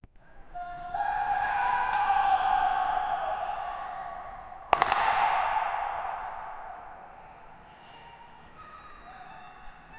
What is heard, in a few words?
tennis match outdoors